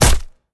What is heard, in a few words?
swing hit; smite; tiamat